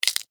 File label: Insect Claw Snap, Insect, Claw, Snap, Movement, Bug; Animals:Insects